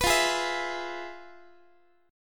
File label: F Minor Major 7th Flat 5th Guitar; FmM7b5; strummed; x,x,14,15,12,15